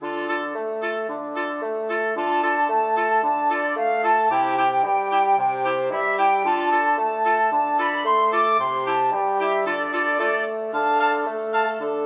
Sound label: flexible mixed ensemble, 4 players